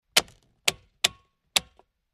Industrial Safty Lid Lock Clicks Diesel Truck Tank Slowly Tightening Lid